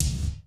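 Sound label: bass drum GATED; Crypton; DrumBase5000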